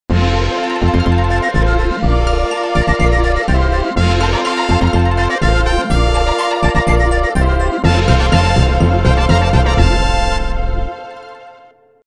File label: Key Opens The Door Won Trophy